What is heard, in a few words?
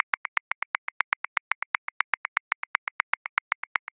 Beguine Accent 16ths; Grooves; Ableton Live 12 Lite; Core Library